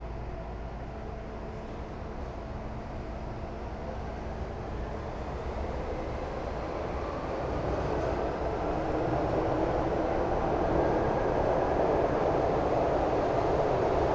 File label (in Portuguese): Metro de brasilia dentro do vagao subsolo viagem mais devagar com pessoas